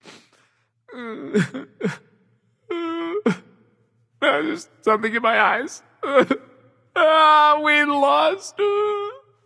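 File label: nonverbal boohoo; smite; voicepack; merlin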